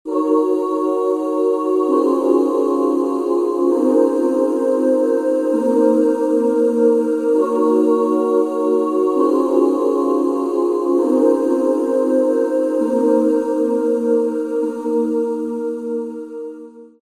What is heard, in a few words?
Restless Spirits; Magnus Choir